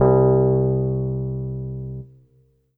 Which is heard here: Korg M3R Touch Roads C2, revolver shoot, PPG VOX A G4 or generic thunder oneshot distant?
Korg M3R Touch Roads C2